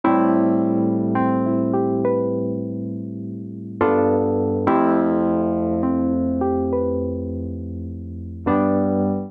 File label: Progression AOR Rock i - VII - VI A Minor 103 bpm, Electric Keyboards; Ableton; Factory Packs